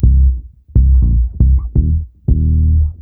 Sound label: SNAIL FUNK C M, Bass, 76 BPM; Sampleheads; Ultra Freakin' Bass